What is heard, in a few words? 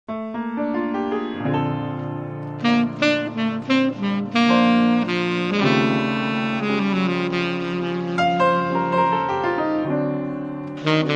Quartet, Piano